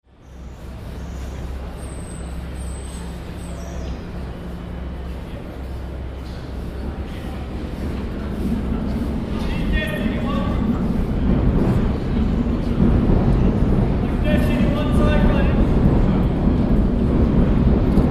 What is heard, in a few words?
borough market